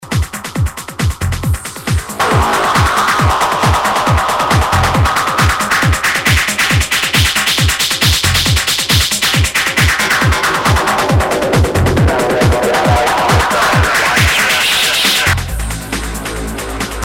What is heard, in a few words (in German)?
Kombination Delay + Fuzz